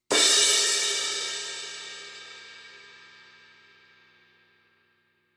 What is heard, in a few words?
formula 602 classic sounds 20 paperthin edge; paiste